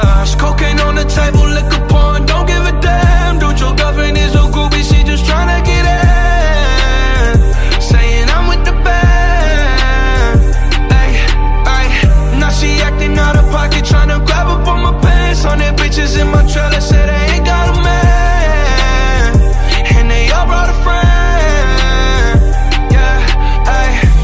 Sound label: ringtone